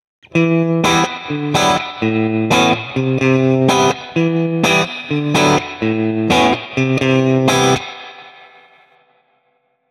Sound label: guitar samples Em bpm126